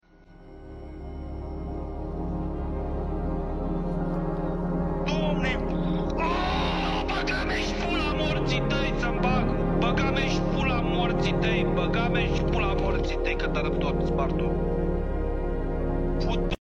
rage

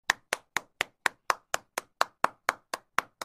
clap fast

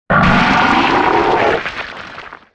smile vomit scary; item asylum

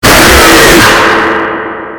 jumpscare

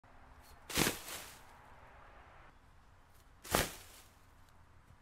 body fall

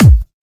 techno kick

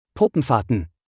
poopen farten

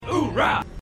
u haa

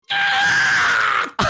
moo scream

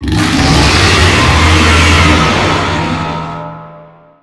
roar; alatreon

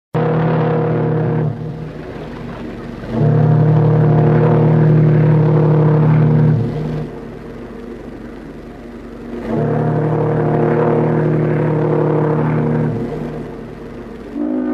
olympic whistle

